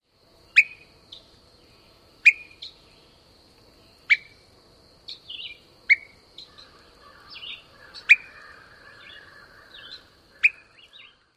pit calls

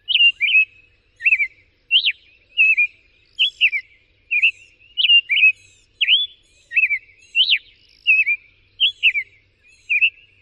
dawn song

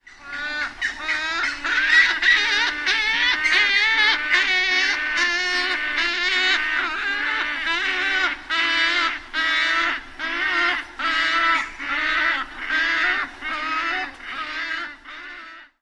colony sounds

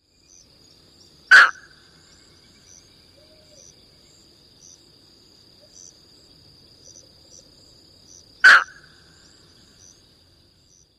skow! calls one call repeated twice

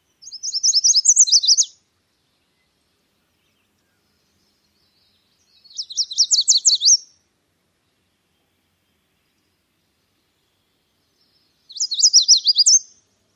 dawn song with song type switches

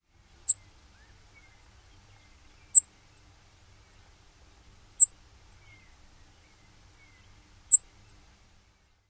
call

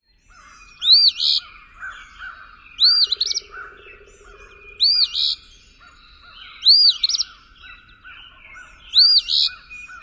song

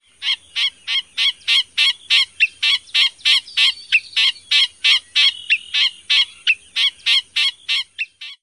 calls

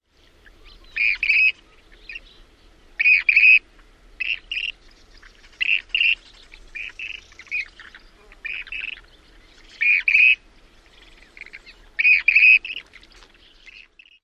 kreed-kreet

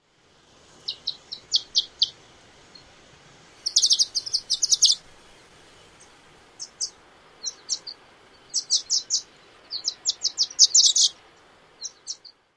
calls

